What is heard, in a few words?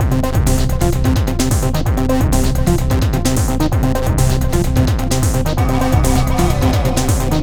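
core 129 C minor, hardcore breakbeat; Big Fish Audio; Hardcore Breakbeat Action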